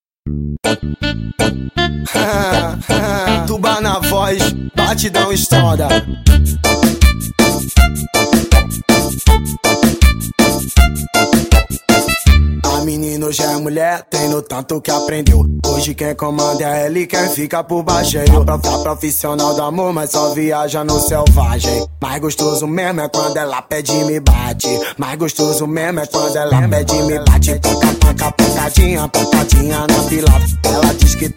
pancadinha

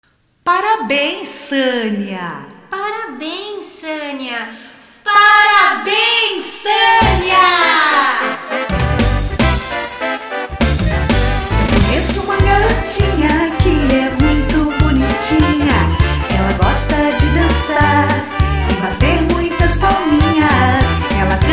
musica personalizada

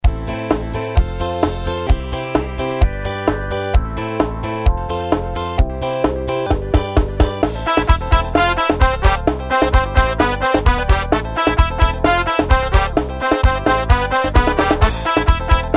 ritmos yamaha forro; forro arroxado

